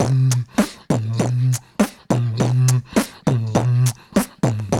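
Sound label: 108 bpm, scratch groove; Scrth Groove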